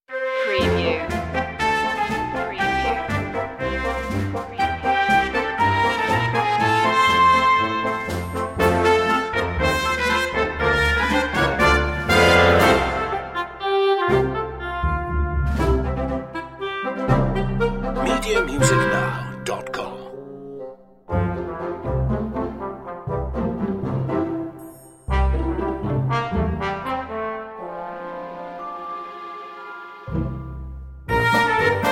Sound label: working hard